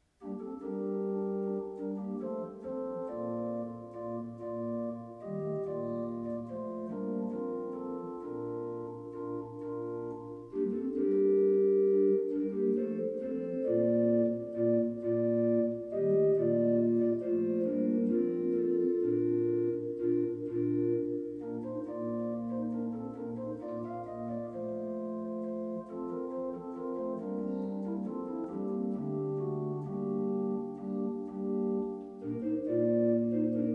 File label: Galliarde, Organ Recordings; Rodgers 945 Home Organ